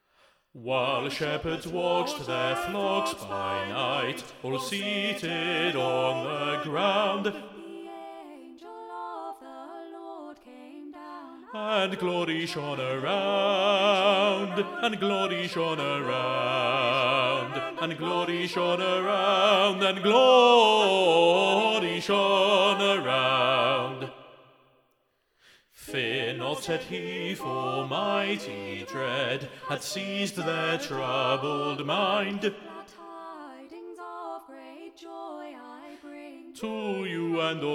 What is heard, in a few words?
Bass Up